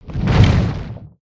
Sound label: Rock Rumble